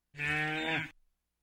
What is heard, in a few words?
Miscellaneous bovine